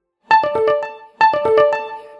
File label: success